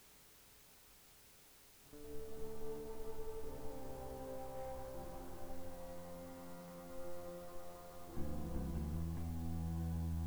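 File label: Monitor Mix, FULL BAND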